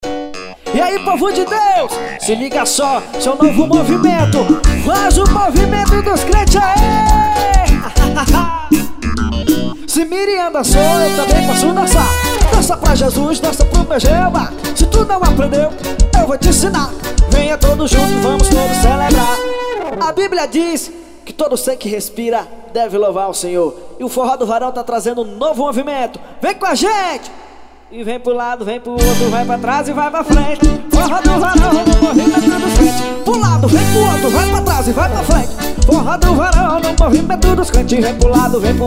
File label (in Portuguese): forró